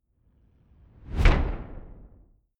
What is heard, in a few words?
rising wood; whooshes rises